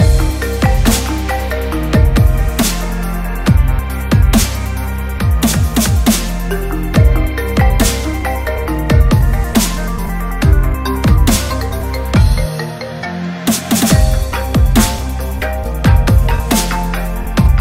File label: iPhone 7 ringtone; apple